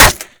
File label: impact wood; weapons; Modern Warfare